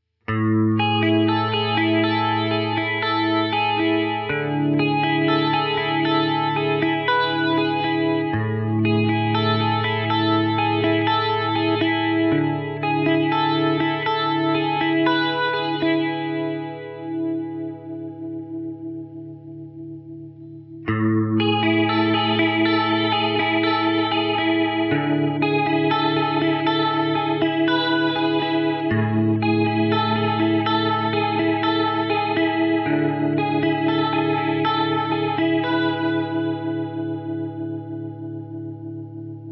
muted chorus

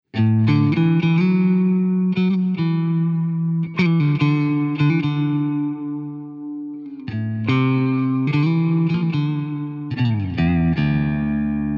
Wet Baritone Electric Guitar Solo; Hall machine set to Concert.

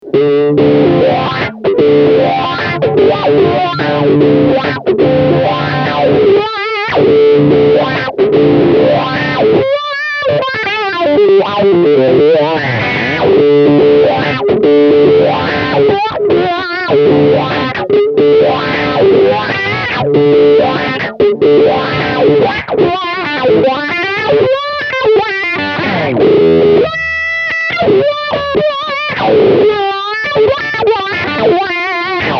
filter wah; mobius

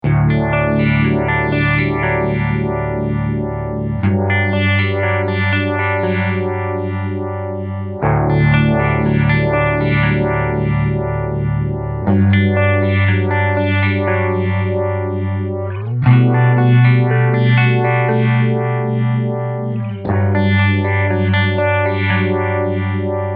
vibe; mobius

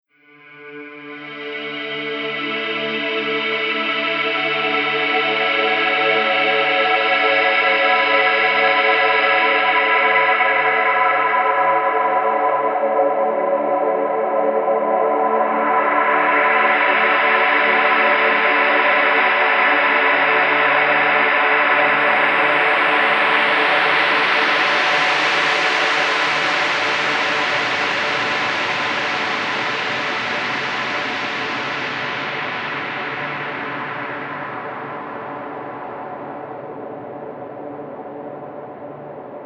BLOOMBIENT BigSky

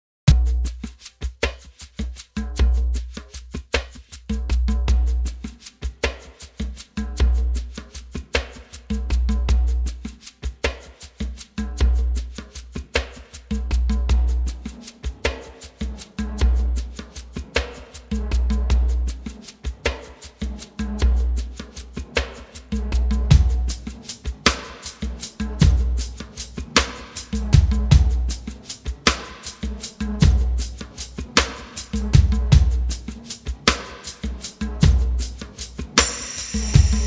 Talking Drums; cloudburst; Ensemble on the shakers and djembe create movement and a talking drum effect, while longer simple reverbs process the si

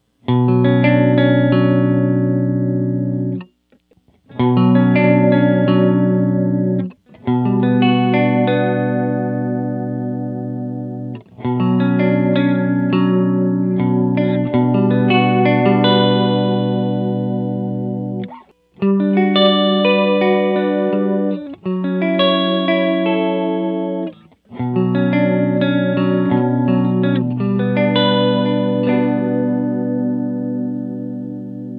chorus lo End, mobius

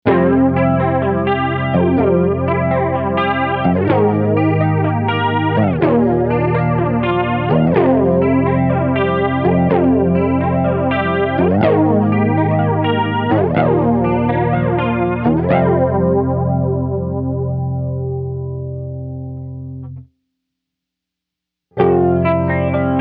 flanger; mobius

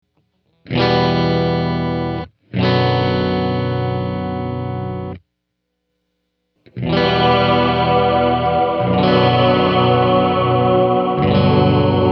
chorale; bigsky; reverb; Reverence

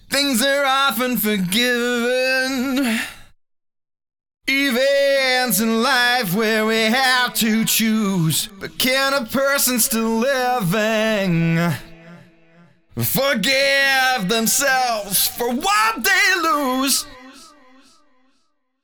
Ping Pong Vocals; 24/96, Ping Pong, sixteenth sync, Mod halfway up, Delay 2 at 152ms

